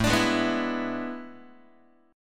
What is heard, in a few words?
A Major 7th Suspended 2nd Suspended 4th, Guitar; AM7sus2sus4 strummed; x,5,5,6,3,3; JGuitar